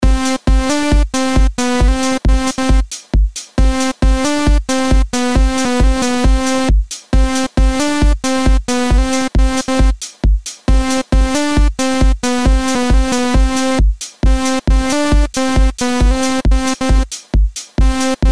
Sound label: Birthday Beat